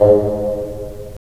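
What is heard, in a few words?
WND BN, wind bassoon; LA Composer vol. 3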